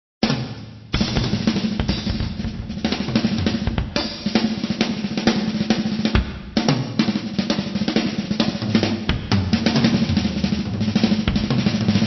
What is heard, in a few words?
Drum fills